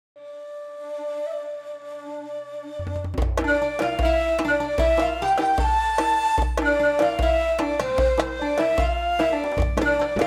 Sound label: oriental style